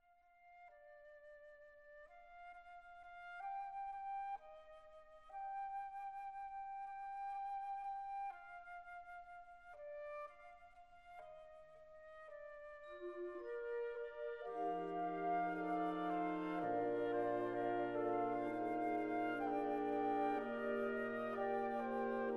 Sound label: Swedish Folk Song, wind band